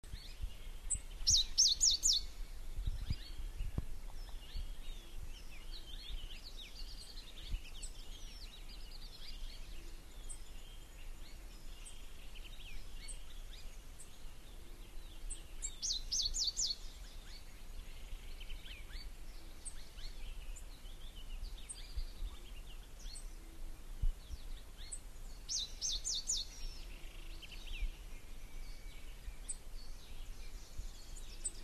white winged brush finch

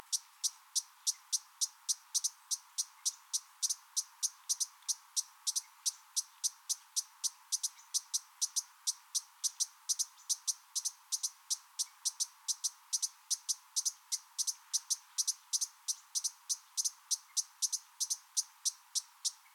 dusky hummingbird

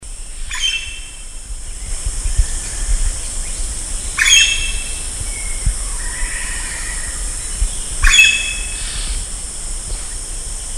andaman woodpecker

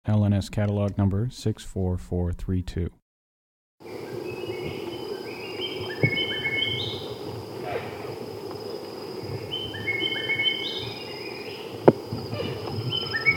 red shining parrot

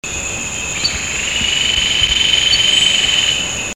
golden collared woodpecker

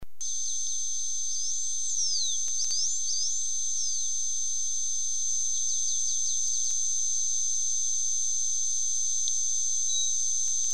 yellow billed blue finch

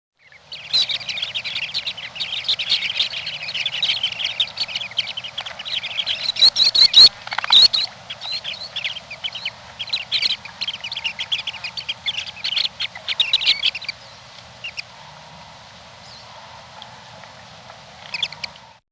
western sandpiper

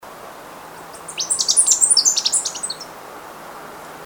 cinnamon tailed sparrow

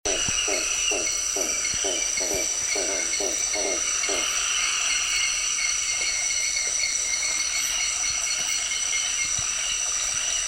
manus hawk owl

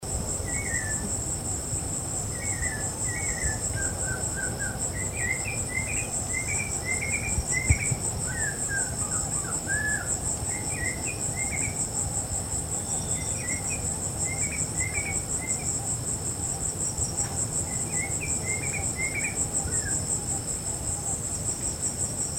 snowy crowned robin chat

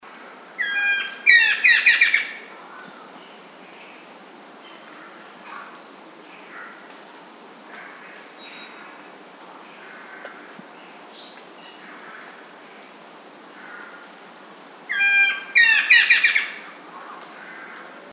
hook billed kingfisher

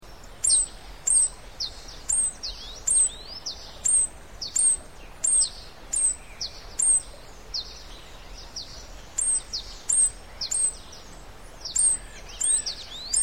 white bellied hummingbird

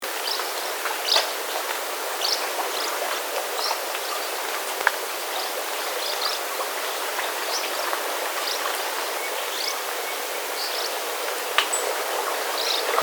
pale footed swallow

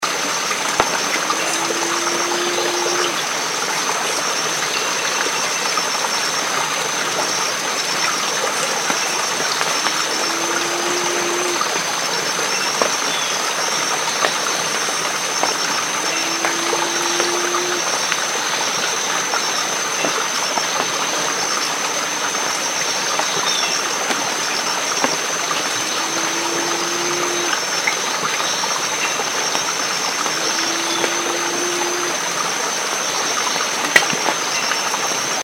banded ground cuckoo

